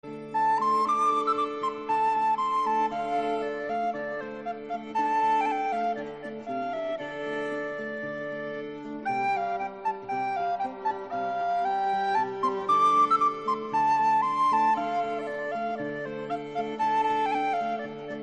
best instrumental all time ringtone, bollywood ringtones